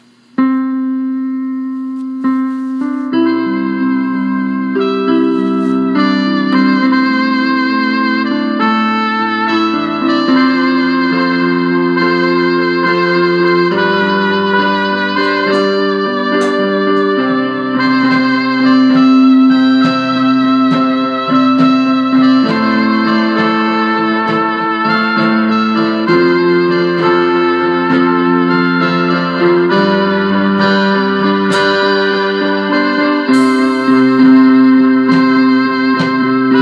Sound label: Instrumental